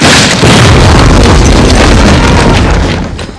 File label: explode